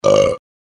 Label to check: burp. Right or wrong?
Right